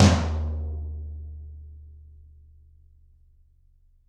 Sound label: Toms, Drums; Bob Clearmountain Drums I